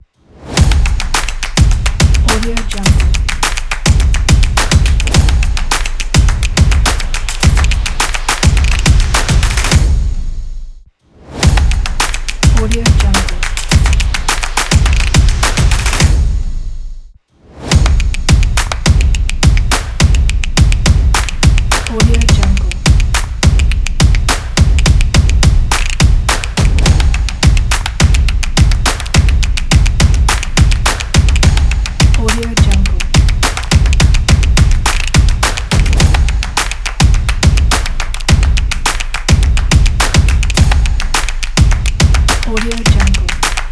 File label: stomp intro kit